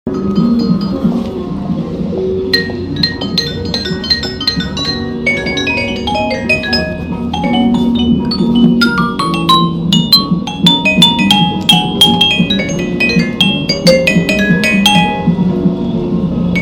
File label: Vibraphone Musician; San Francisco, CA • 2004; Life on Market Street: An Audio Archive